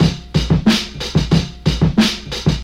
122 bpm HQ breakbeat A key, Free drum groove - kick tuned to the A note. Loudest frequency: 1353Hz